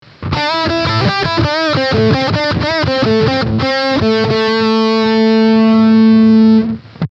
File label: riff; Modern High Gain; Kai's Tone Shader; JSFX (Reaper); guitar tone